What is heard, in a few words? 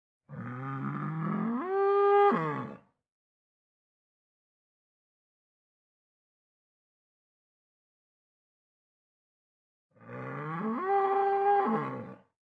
Cow Walk; blockade classic